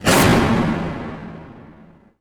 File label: cinematic impact wipe